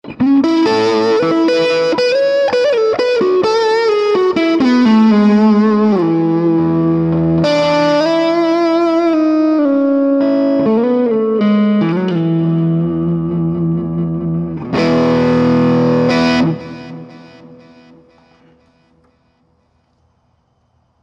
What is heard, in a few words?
neck gain, guitars